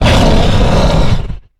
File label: Exertion Heavy; Evolve; Goliath